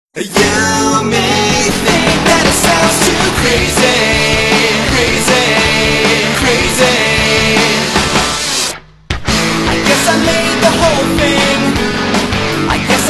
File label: Kick; Motorola; RAZR-V3xx; Vodafone